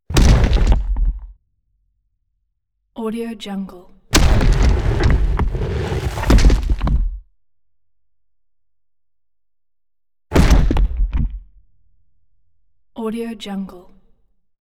rock hit huge crunchy thunk impacts booming

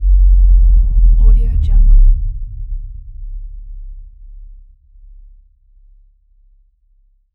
bass drop

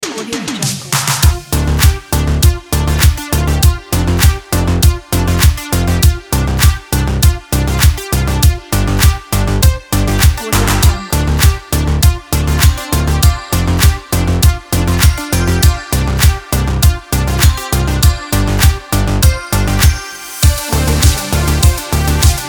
synthwave

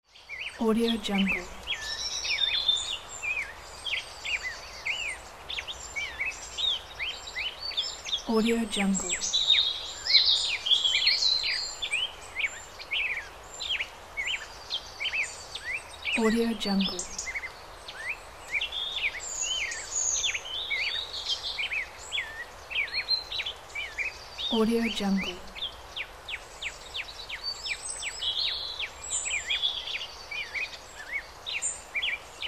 forest

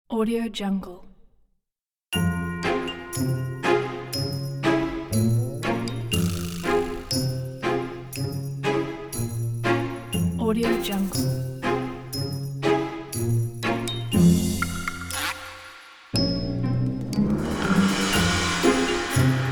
sneaking christmas comedy detective

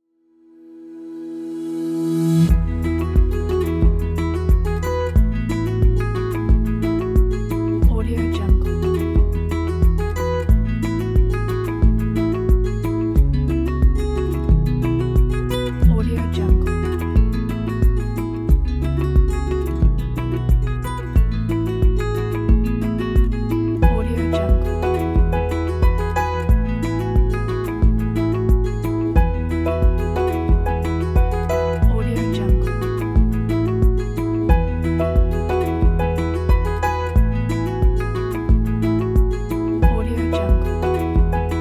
simple acoustic inspiration

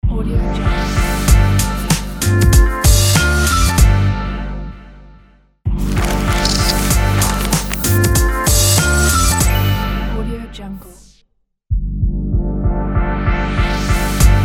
hip hop logo

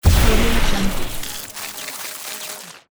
lighting big shot spark; jbobstudio

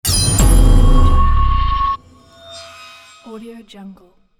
cinematic whoosh metal hit

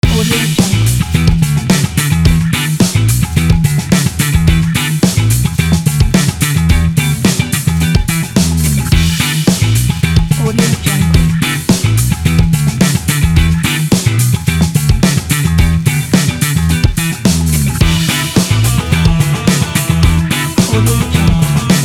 funk loop; stereo-color

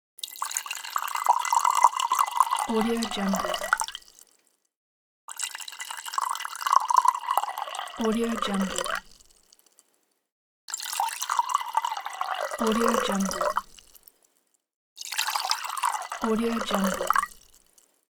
water pouring glass cup

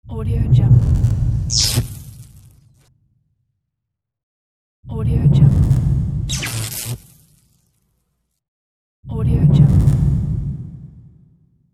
whoosh electro logo

